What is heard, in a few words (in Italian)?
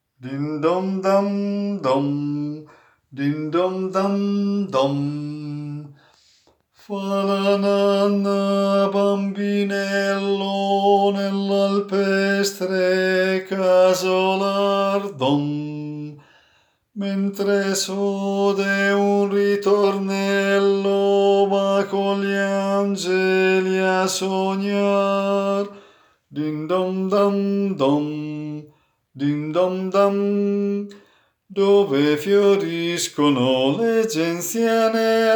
ninna nanna baritoni